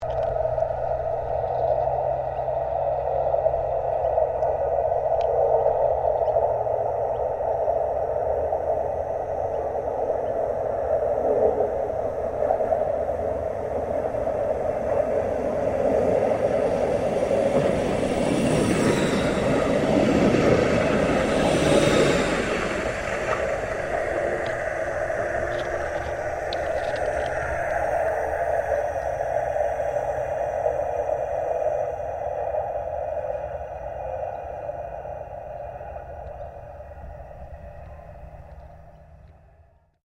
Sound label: Tram Melbourne C Class Passing Slow; Various Melbourne trams; Streetcars; Vehicles